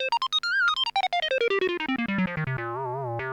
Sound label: mini on memo